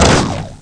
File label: super spring; Ferazel's Wand